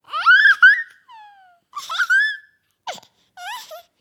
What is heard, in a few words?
Laughing Baby